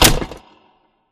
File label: Fence Flip Stop; Titanfall 2; Boomtown